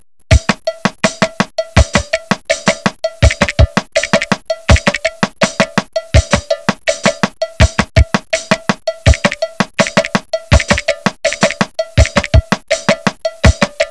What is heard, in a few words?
Drum